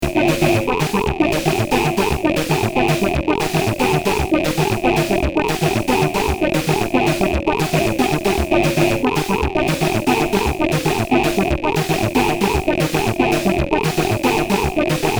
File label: vocal; synthmodules